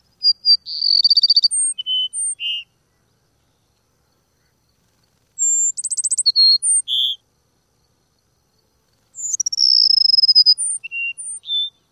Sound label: song; AZ